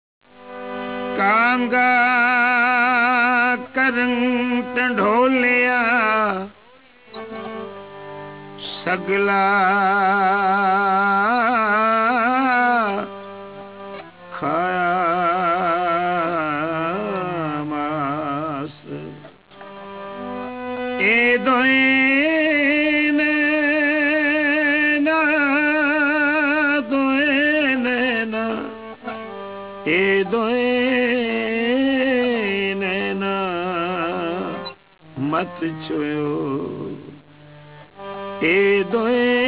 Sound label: Shabad Punjabi